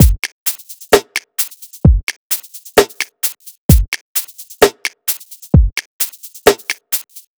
BEAT, Hip-Hop & R&B; USB Soundscan vol.47; AKAI

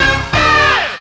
GRUNGE; AMG - Vince Clarke Lucky Bastard

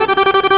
ACC TRILLER; Best Service; World Colours

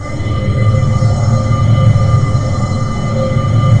BELLOWS; Pro-Rec - Synthsonic

